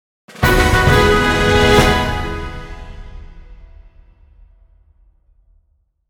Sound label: Fanfare (recruitment); Fire Emblem Echoes Shadows of Valentia